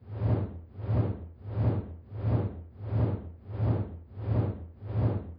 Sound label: Windmill